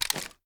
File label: fast reload empty raise; gmod